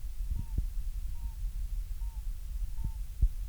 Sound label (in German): fiepsen, octodons